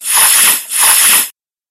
monster snake

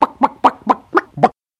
chicken

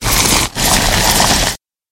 monster flea swarm

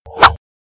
miss

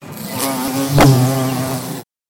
monster giant bee

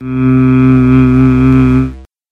tradeskill healing

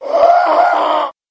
monster cursed scholar

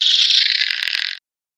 monster wraith

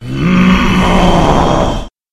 monster storm lord

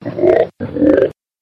monster frog

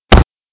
block

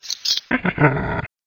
monster spider queen

